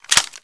usp 9mm clip

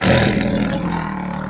bear pain

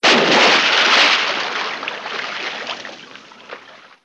water splash

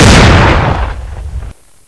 fire

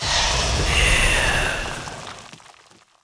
drain life draw, weapons

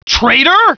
hurt soldier

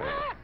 scream

